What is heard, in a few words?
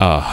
AAH; AMG